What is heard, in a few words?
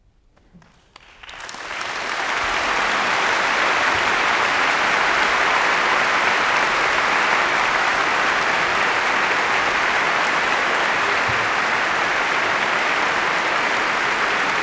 Applause; Symphony in D major, Hob.1-104 'London'; Sergiu Celibidache; Haydn